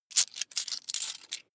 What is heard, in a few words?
coins big clatter fast